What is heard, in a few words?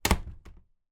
household caravan front door close